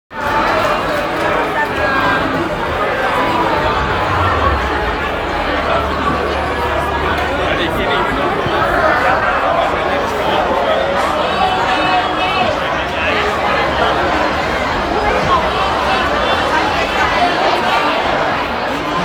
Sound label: oktoberfest hall; octoberfest